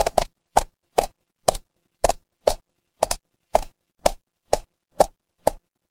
footsteps heels

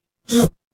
retro game enemy death

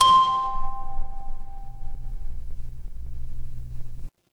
instrument kalimba

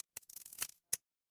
glass crack

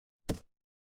retro game hit

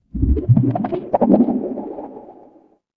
water bubble

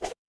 ui delete

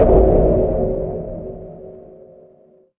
magic scifi alien

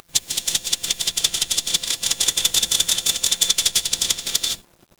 mechanical engine

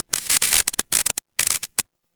fire electric spark

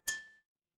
glass clink

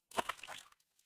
water splash

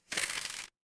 paper fabric crumple

